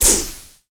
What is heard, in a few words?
Match Overlay Scroll On Off; Championship Manager 2006; XBOX